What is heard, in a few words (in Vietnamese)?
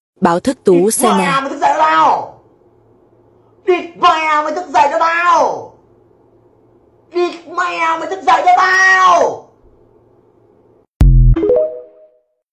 nhac chuong bao thuc